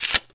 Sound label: pickup; deathlegends